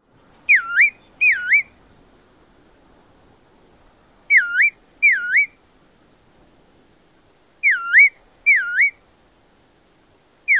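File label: Bristle-thighed Curlew calls; AK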